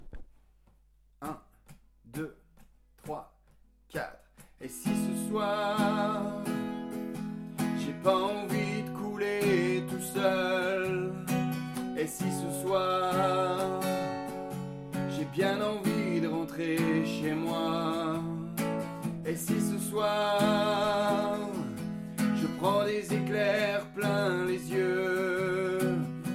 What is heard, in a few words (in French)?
casser la voile